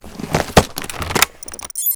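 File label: weapon foley uav control unit pull out; Call of Duty Modern Warfare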